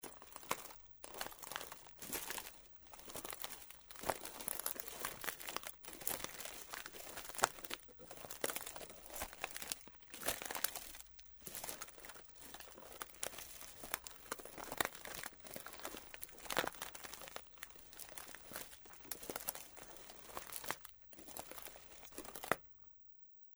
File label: footfalls Backyard Dry; footsteps